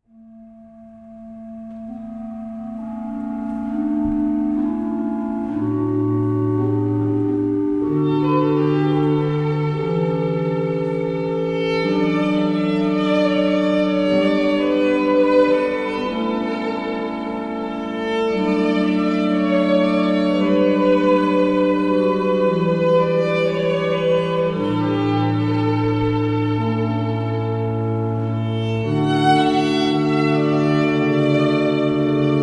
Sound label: oboe